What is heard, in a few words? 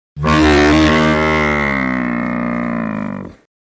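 sounds of bulls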